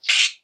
alien chirp, Alien Chirps + Screeches

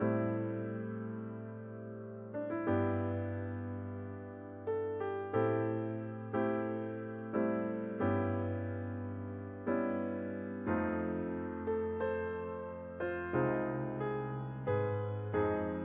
homely piano

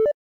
vg menu highlight

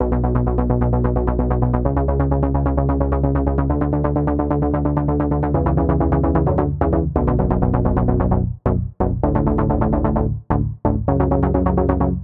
Space Bass

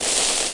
fuse

